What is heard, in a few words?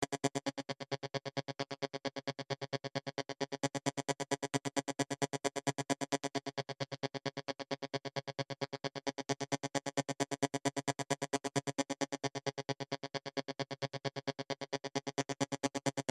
classical